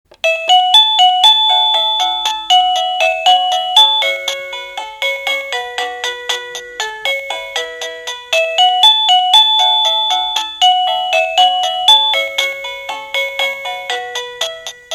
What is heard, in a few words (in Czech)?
melodie